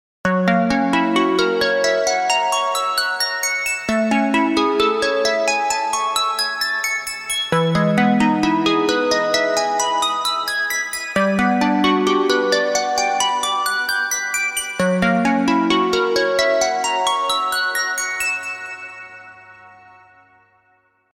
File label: Soft Harp; Samsung Galaxy S20 Ultra